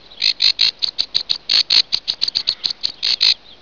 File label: WARB